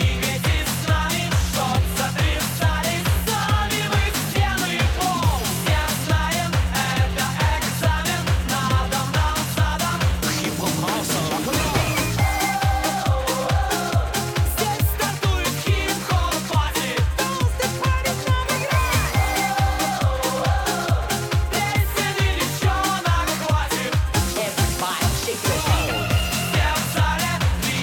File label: Loop; VseVZale